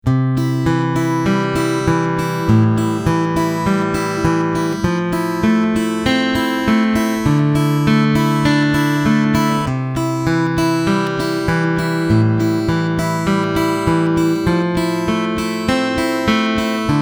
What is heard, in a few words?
Fingerstyle, acoustic guitar